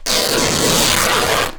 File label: rip, Canvas Bag Rips